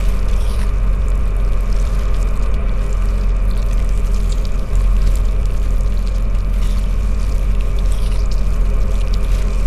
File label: ship juggernaut idle; biogenesis